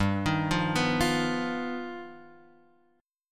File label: G Augmented 7th, Guitar Open F; G+7 arpeggiated; x,2,3,0,2,0; JGuitar